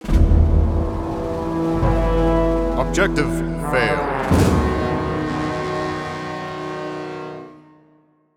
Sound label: Fail; Agathia; Age of Chivalry; Windows